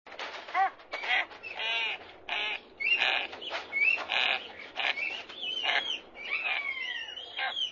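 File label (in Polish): Orzeł stepowy - Aquila nipalensis, głosy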